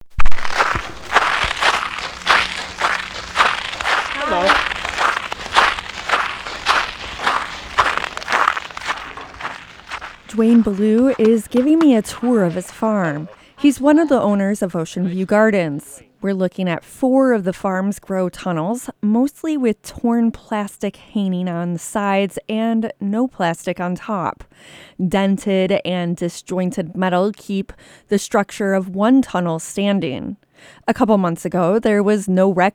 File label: ocean; a winter storm collapsed grow tunnels a local farm presses on